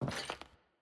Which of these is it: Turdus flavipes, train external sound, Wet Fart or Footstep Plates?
Footstep Plates